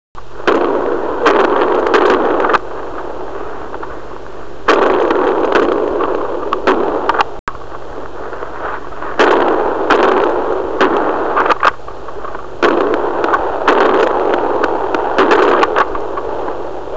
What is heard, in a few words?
background fire; ambience